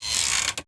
Wooden Gate Creak; Playable Tutorial Level

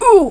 Take Hit; TR3; Playable Tutorial Level

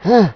Grunt; TR3; Playable Tutorial Level

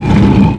Rolling Door; Playable Tutorial Level

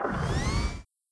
Vehicle Upv Start; Playable Tutorial Level; TR3

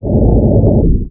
Rumble Nextdoor; Playable Tutorial Level

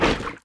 Hammerhead Attack; Playable Tutorial Level

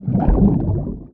Scuba Diver Diving; Playable Tutorial Level; TR3

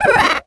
Exhibit Mummy Rahh; Playable Tutorial Level

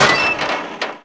Floor Metal Open; Playable Tutorial Level

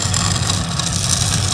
Pushables Move Metal; Playable Tutorial Level

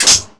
Mapper On; Playable Tutorial Level

